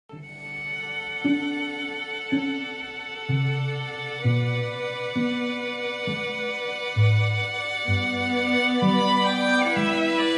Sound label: air on a g string, bach